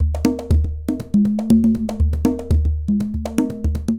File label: Conga, Latin Percussion; Instrument Kits; Ableton; Factory Packs